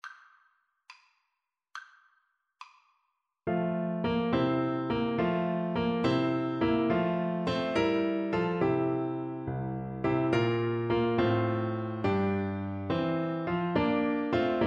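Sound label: recorder karaoke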